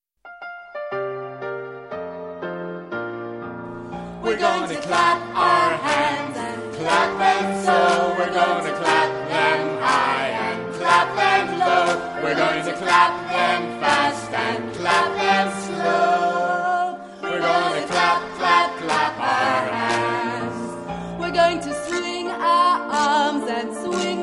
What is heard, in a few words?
Clap Hands